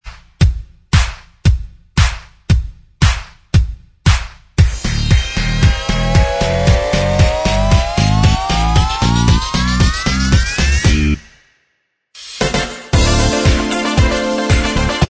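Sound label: instrumental track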